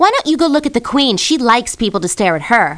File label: saz